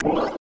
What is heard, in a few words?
powerup short